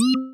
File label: UI enter; Megaplane